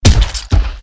Dragon Wound

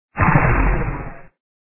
spider low

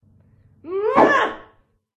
bot human female diver attack voice up

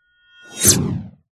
cat attack voice

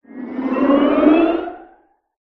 dragon mage buff

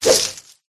flower dodge

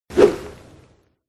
bat dodge

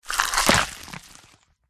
crab death

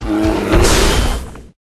White Bear

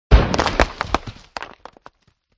rhino buff

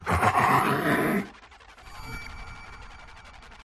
Rat stun

